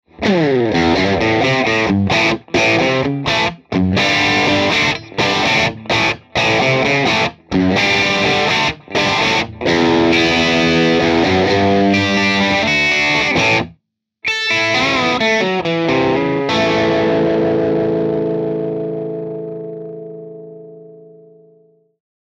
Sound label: Classic Rock Riff